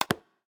biometric id lock; LG Wing 5G Verizon

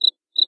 Cricket; Itel itelOS 13

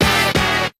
drumset star; SKY IM-A760S-VEGA-Racer; keytone

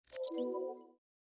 onboarding intro, Samsung Galaxy S10; Bixby

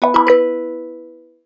Im received; Samsung SGH-i450v

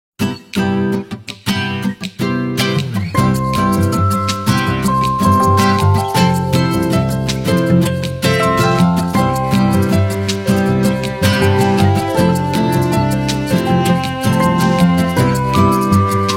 Nokia Half past twelve

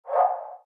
haptic flic swipe; Sharp; HAPTIC 3LH FLIC SWIPE; AQUOS sense lite SH-M05

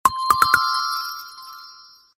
Meteor, notifications; Meizu X8

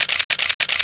camera shutter basic 3 shot; Samsung SGH-i320; Camera Sounds

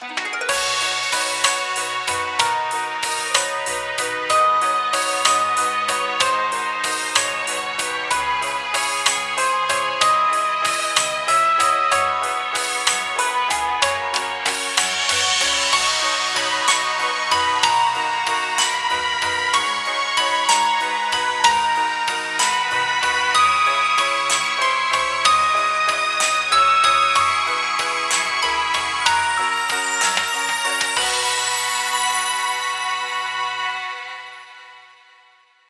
Waltz with Birds; sky ringtone

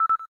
cam timer last; LG; G-Pad-II-8.3-LTE-P815L

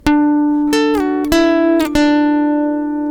Notification Tone; WE X2

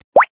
Popup; Kyocera; KX5-Slider-Sonic-Virgin-Mobile

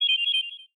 Cutie, notifications

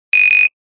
Buzzer; Nokia; 6300; Ringtones

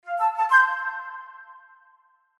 Tenon Calendar, Flute; HTC; Flute 1

